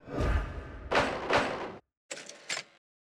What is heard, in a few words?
great deduction; sherlockhd